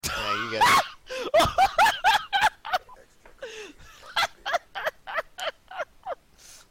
laugh

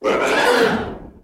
expert roar; eoc

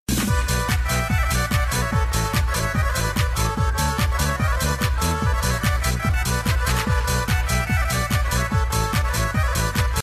just some accordion

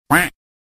cuak sound effect

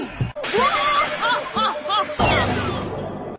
BWAHAHAHA

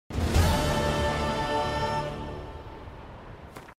quests completed sound; the witcher 3

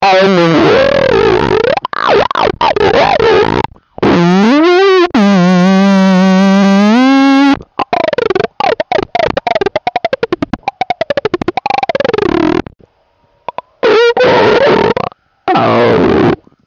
aaaa auu

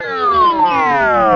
sliding down

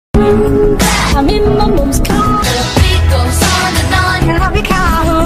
boom clap; im in me mums car

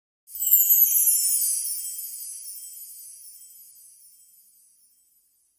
sparkling

